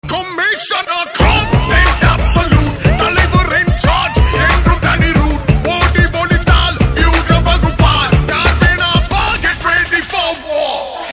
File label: Tamil Theme Ringtone; Darbar